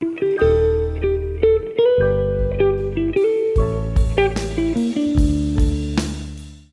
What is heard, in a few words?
horn theme phrase